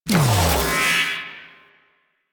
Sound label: Shield Armor Ability Cast; Warframe; Helminth Abilities